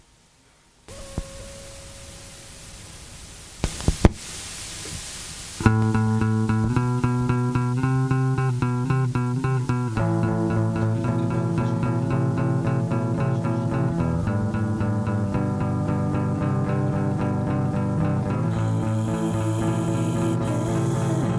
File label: Bass Tavern